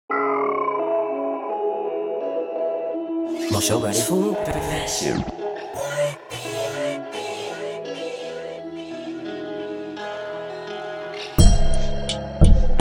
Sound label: Type Beat TRAP